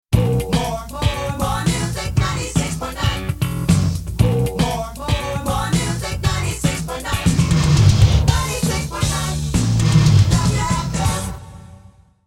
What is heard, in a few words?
Jingle, radio